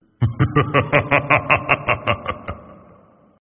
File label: hahaha; AQ2